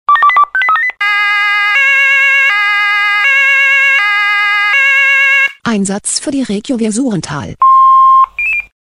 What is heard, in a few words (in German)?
SMS Alarmierungstone; Suhrental